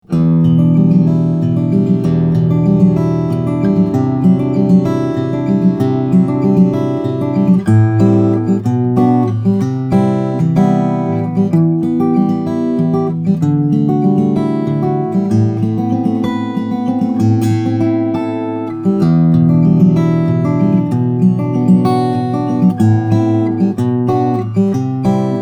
rein rjn-3c, steel string guitars flattop

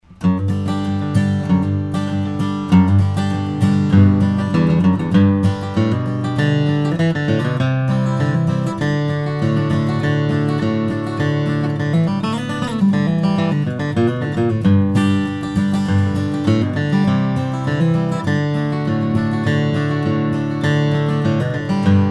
martin spd-16tr, steel-string flattop guitar; Indian/German; dreadnought; The Special Edition 16s were made by Martin Guitars beginning in 1996

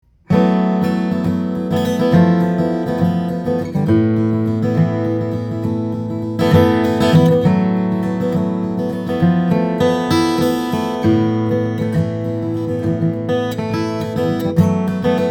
Martin D-45, steel string guitars flattop; martin cs; guatemalan rosewood adirondack spruce

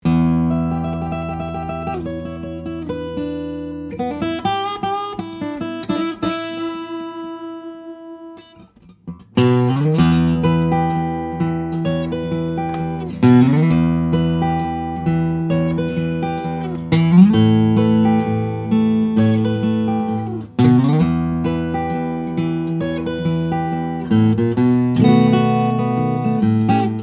steel string guitar flattop; rainsong bi-ws1000n2 carbon fiber

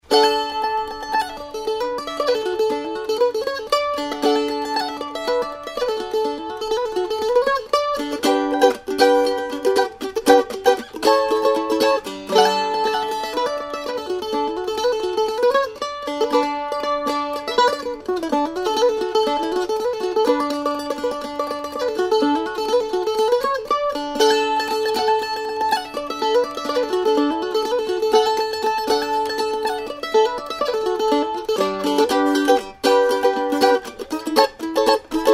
mandolin blackface; beardsell

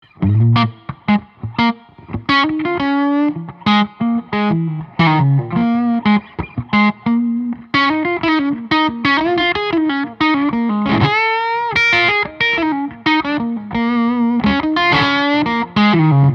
Semi Hollow, electric guitar; lyra; mahogany; maple; Gil Yaron

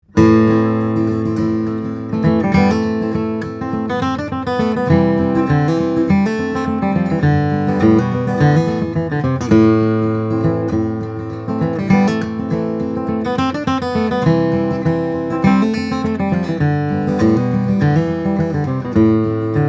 Martin D-28, steel string flattop guitar; authentic 1937; guatemalan rosewood; adirondack spruce

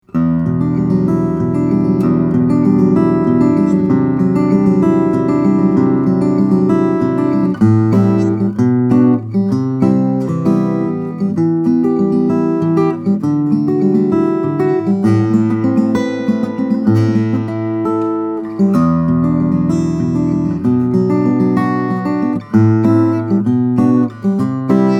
concert, steel string guitar flattop; ensor

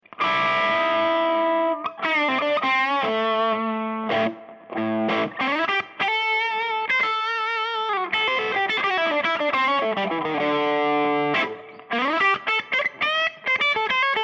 relic telecaster, electric guitar; monroe

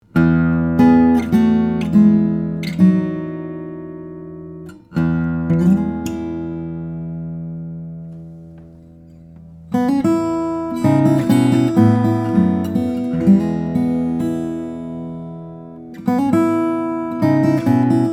Grand Salon OMC, Madagascar/Adirondack Spruce; flattop; 2017 Ivanov Grand Salon OM-C

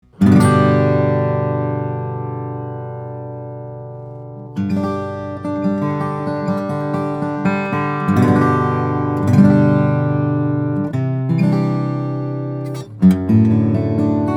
Greenfield G1, steel-string flattop guitars; pernambuco; moonspruce

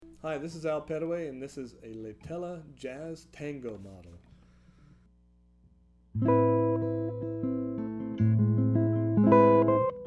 jazz tango, hollow-body electric guitar; lehtela; fingerstyle jazz hollow body

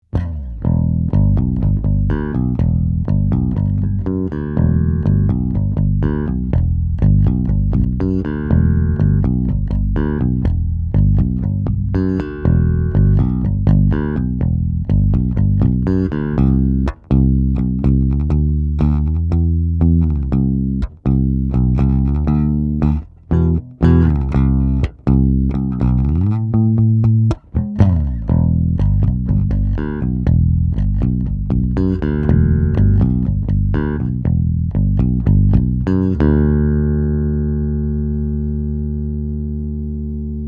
Bass Guitar, electric bass; mtd; model 905; basses; MTD 435

